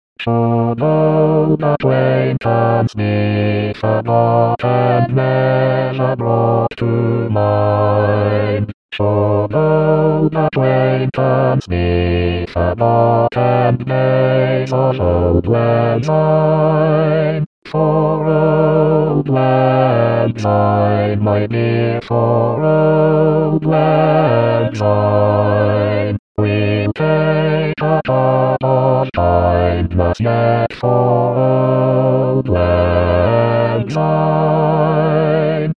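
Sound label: bass; Auld Lang Syne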